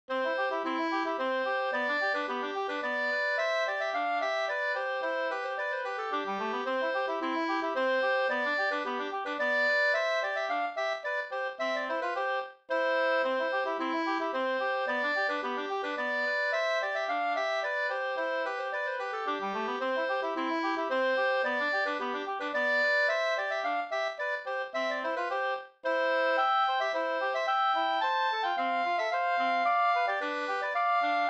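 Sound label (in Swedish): slangpolska, two-part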